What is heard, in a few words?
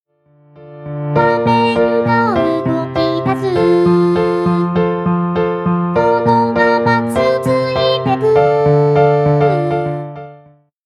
song